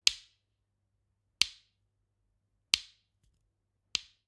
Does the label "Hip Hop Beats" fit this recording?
No